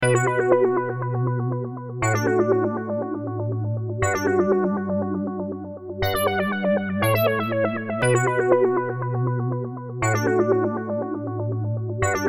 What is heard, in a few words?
Sample loops